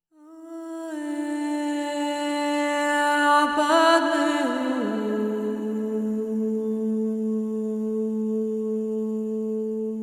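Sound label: om mani padme hum, 432 Hz; INDIGO; LA; LE SOIN PAR LA MUSIQUE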